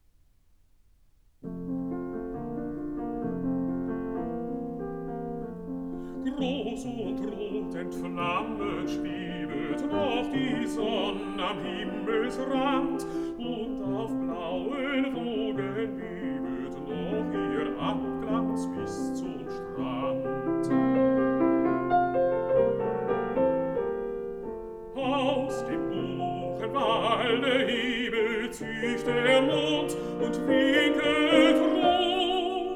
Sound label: song for voice & piano